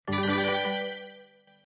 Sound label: sfx collect; fruitcake